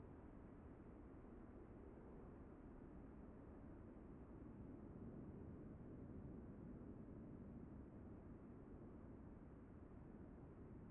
basic Ambience; DOA2; netent

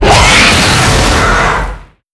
Grunt Die; netent

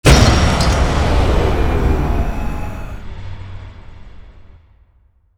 scatter win; netent

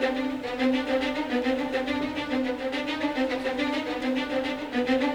Wait Loop; netent

